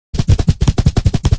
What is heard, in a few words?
punch missed barrage